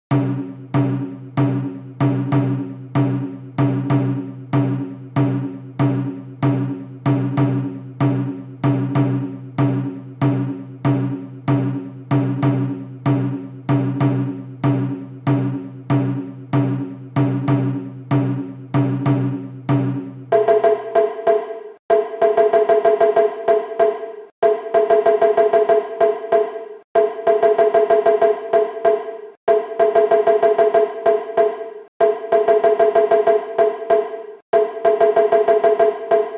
matsuri nagado shime 95bpm

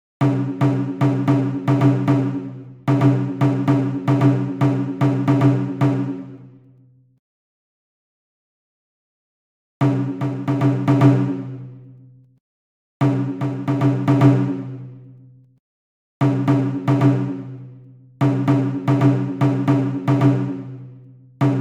kizuna intro 150bpm

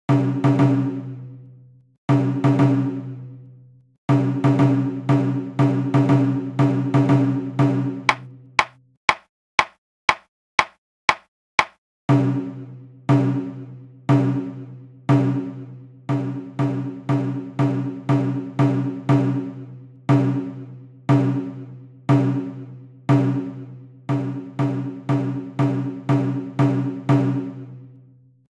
isami goma group a 120bpm

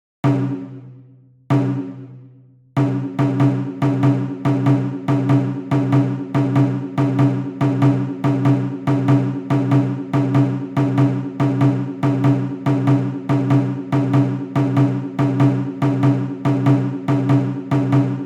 matsuri group a1 shime 95bpm

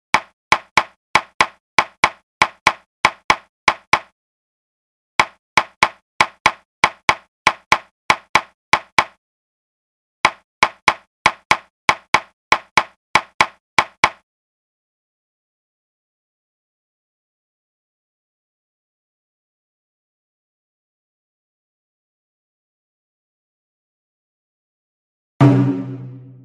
hiryu sandan gaeshi group a group b 95bpm

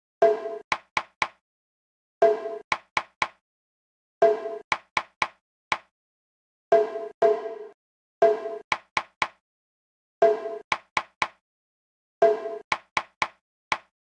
mitsu uchi shime 120bpm; vorspieler

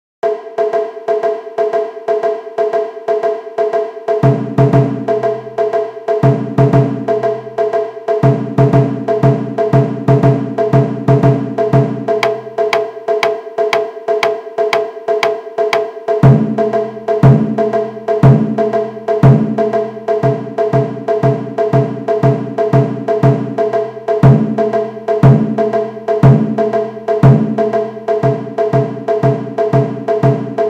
isami goma group a group b shime 120bpm

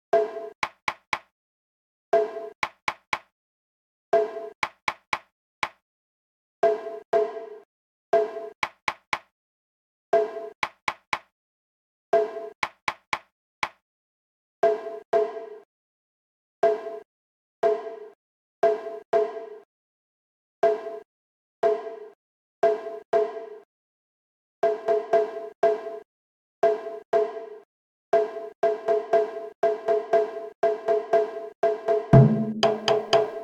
mitsu uchi shime solo 120bpm

